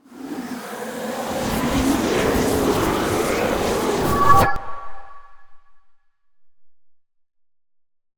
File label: Ice Spell Freezing Slowing Frostbite Aura; Arctic Aura